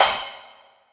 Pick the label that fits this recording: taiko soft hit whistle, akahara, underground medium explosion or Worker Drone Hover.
taiko soft hit whistle